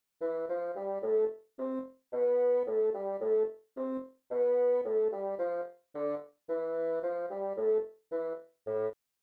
Natural, scales Minor

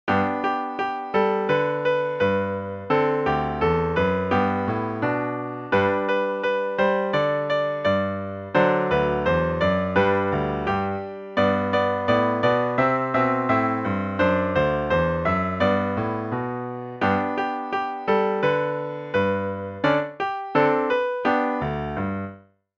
Duet; Op Een Grote

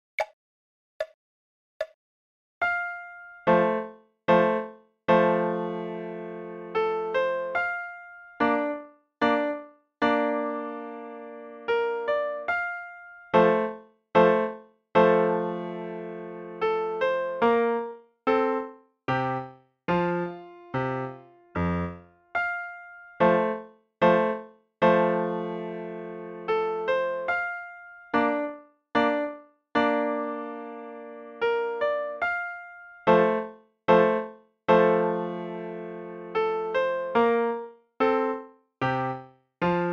Leopold Minuet Slo

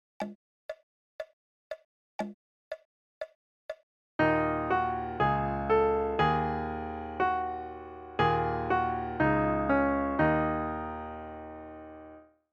Duet

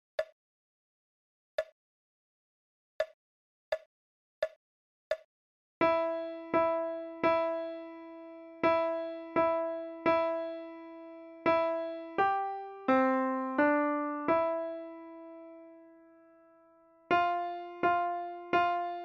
Jingle Bells Slo

